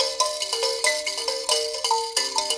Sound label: VIB KALIMP, VIB KALIM; Spectrasonics; Heart of Africa 2